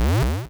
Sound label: something big, square waveform; Square Waveform sfx